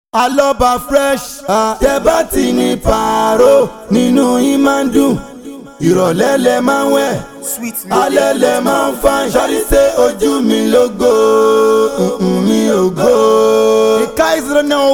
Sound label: Intro Jingle